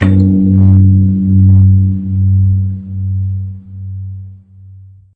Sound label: Temple Bell Huge; WoWInterface; WeakAuras